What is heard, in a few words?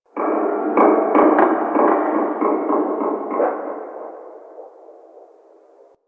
Ocean Underwater Creaks Medium; Ambience; Warframe; Grineer Ocean